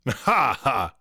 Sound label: vocal witch doctor laughing short sarcastic laugh male dry